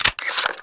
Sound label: awp clipout, weapons; cstrike